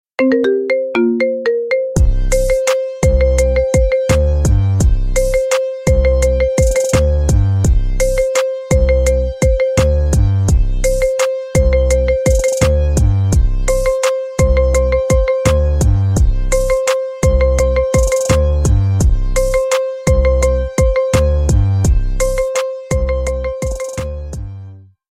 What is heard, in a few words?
marimba remix